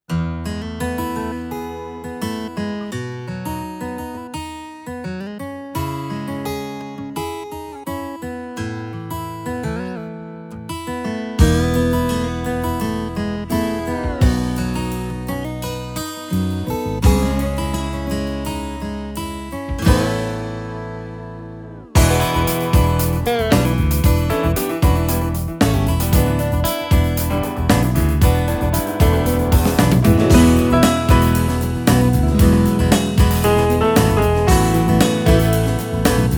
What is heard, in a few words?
Guitar; Guitar World